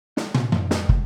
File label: Boogaloo - Kick, 82 BPM; Drums; Intro; Sonic Foundry; Drums from the Big Room The Mixes